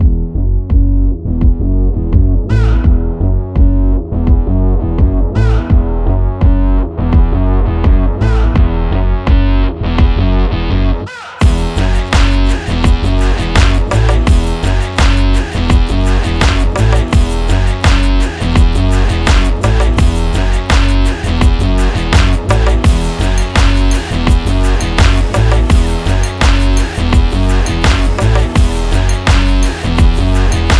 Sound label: Cool Trailer